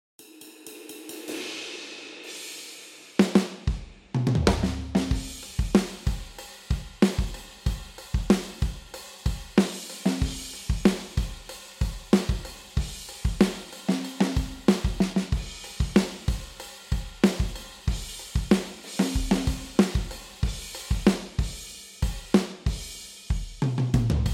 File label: drum